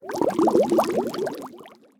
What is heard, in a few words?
transition bubbles; Hungry Shark; Wazdan